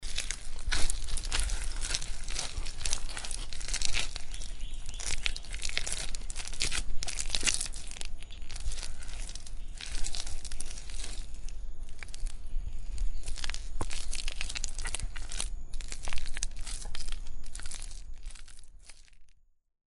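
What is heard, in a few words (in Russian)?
kneading mud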